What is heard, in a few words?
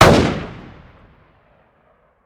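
weapon ar fire player atmo exterior; gmod; viper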